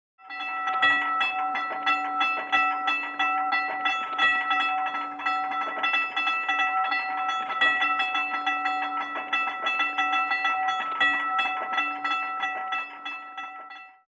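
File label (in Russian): Zvonok na zh-d pereezde, bell at railway crossing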